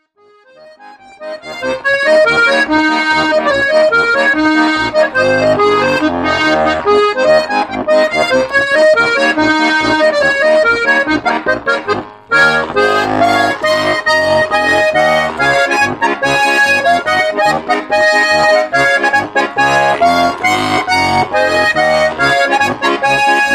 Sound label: polka